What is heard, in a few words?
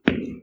stone Footsteps; voxelverse